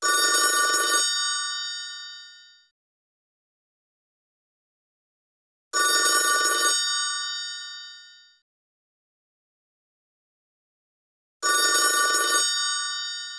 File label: Phone; EDAN ECG SE-1515; ECG Numérique